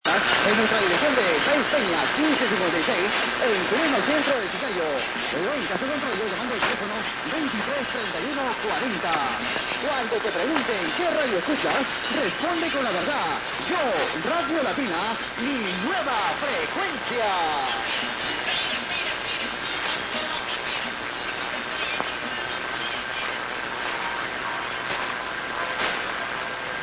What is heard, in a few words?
Radio, Chiclayo; Radio Latina